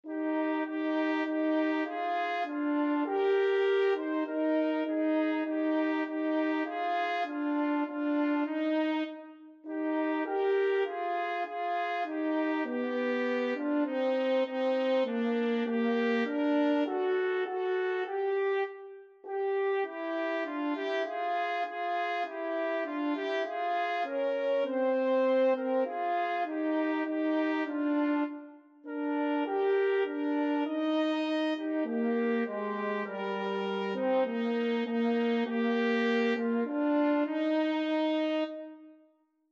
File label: trumpet french horn duet